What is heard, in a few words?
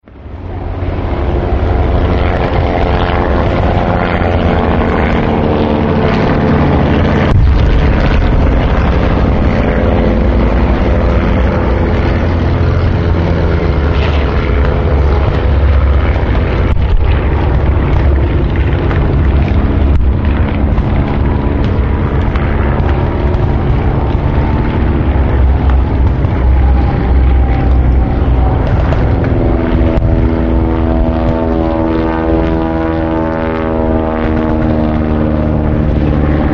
WW2 Battle Intense; Battlefield Sound Effects; Field Fire; War